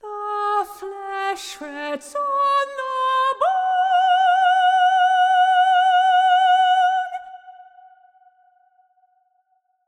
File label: Hush, Lullay, vocal phrase